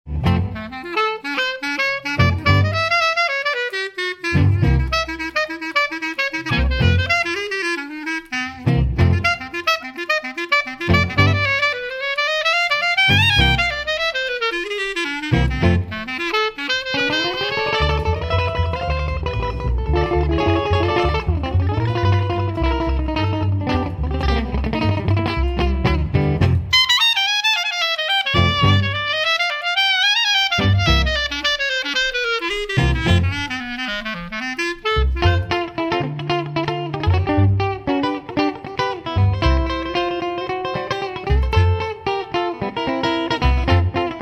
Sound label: chicago rhythm